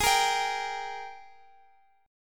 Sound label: G sharp Major 7th Suspended 2nd, Guitar, Open F; strummed; G#M7sus2; x,x,x,15,13,14